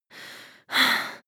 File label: breath female active aroused